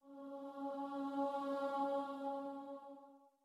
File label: starting note, voice; andante with a crescendo